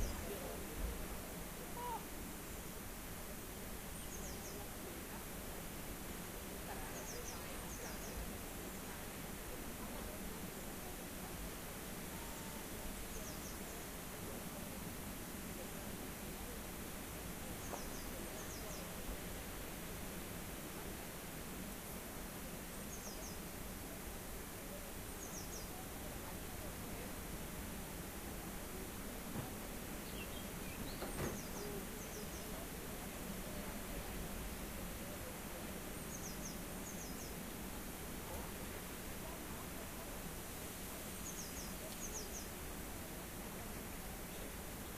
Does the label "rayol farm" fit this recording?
Yes